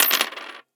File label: Action Drop Coins; Sound Ex Machina